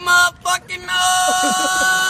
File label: ringtone